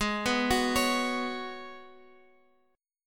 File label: G sharp Minor 11th, Guitar Open F; arpeggiated; x,x,8,6,9,8; G#m11